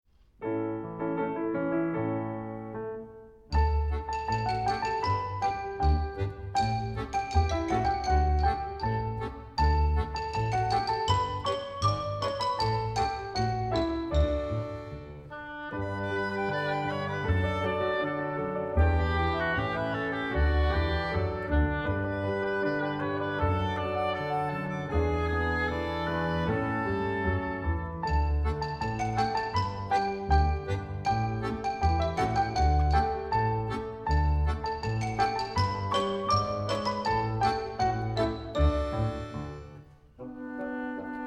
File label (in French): chant libre orchestre seul